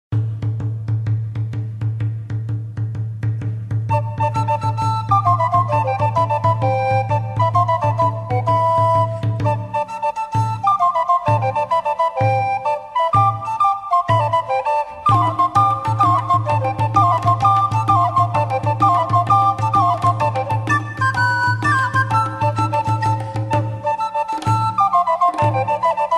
melodia tambores y flautas musica de la edad media

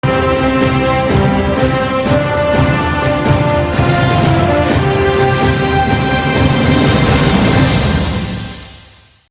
Intro para noticias

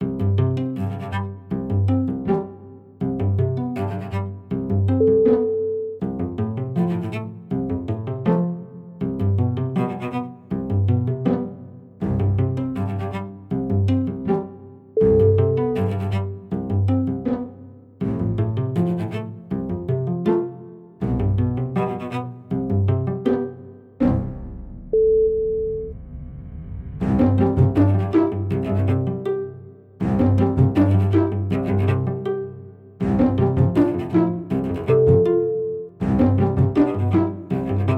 melodía orquesta clásica

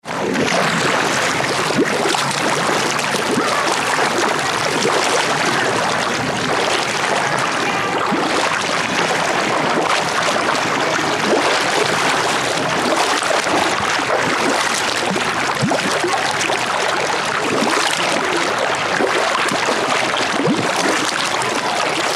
agua

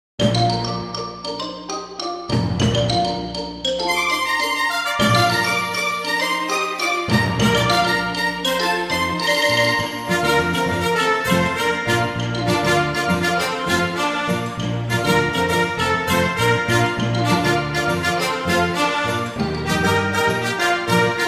De La Sirenita Versión Instrumental con Orquesta Filarm; Orquesta Filarmónica

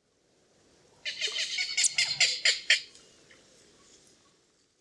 Avetorillo común Ixobrychus minutus

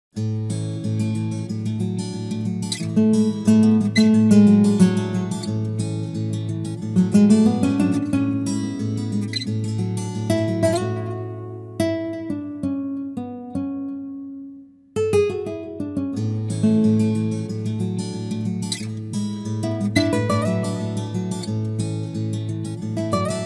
Sonido de Guitarra Acústica; Sonidos de Instrumentos Musicales

